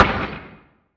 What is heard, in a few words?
asteroid damage oxide small; Factorio